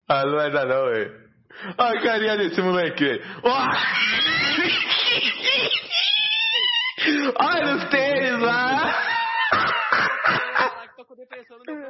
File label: laughing brazilian man meme